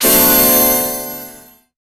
golden aura burst deep, radiant

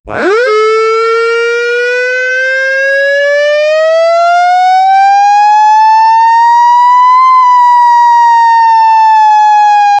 a wail siren similar to, emergency vehicle

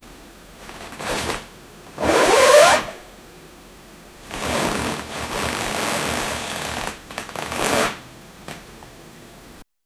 curtain flap fabric wrap

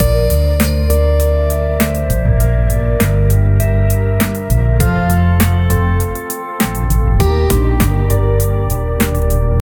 chill music

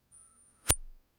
a short clean game sound, shooter

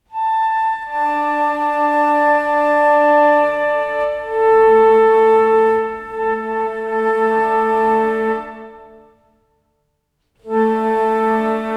symphony with shitting so, comedy

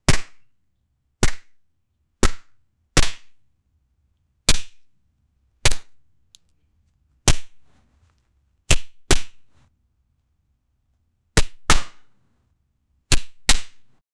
punch sound; fight

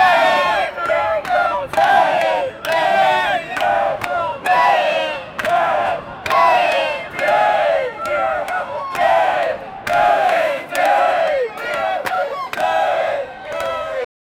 500 people protesting; collective

